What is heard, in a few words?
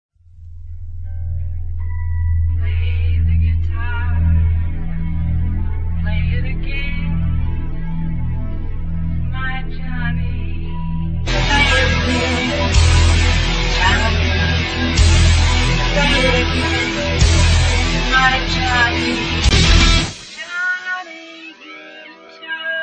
guitar